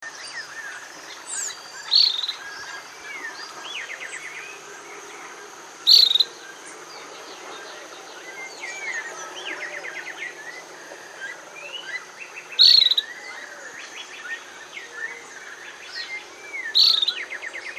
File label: tropical pewee, Belize birds birdsongs